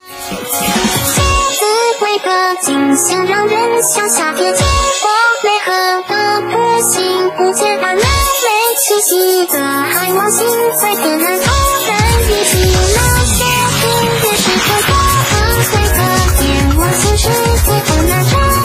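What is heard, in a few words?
background music crossfade; Invaxion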